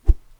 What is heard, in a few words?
Stick